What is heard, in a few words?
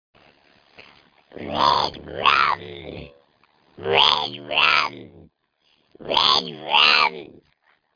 Chill, ringtone